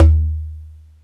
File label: CONGA SET; OMI - Universe of Sounds